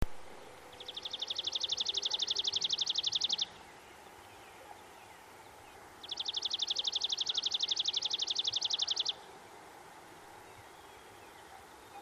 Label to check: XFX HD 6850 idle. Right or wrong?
Wrong